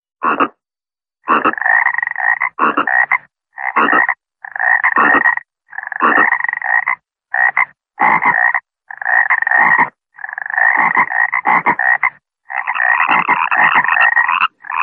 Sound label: frogs ringtone